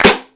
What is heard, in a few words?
hand clap sample G# key; single hits one shots